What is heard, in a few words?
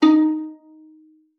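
violin pizzicato D#4; Xsample VOL-5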